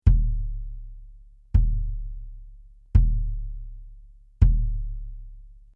BASS Soft Felt

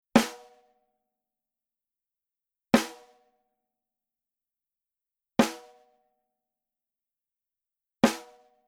SNARE Hybrid Center Hard

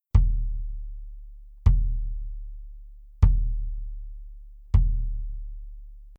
BASS Soft Felt

